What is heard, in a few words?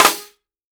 jungle snare chromatic, real drums; ProSamples vol.43 - Real Drum Kits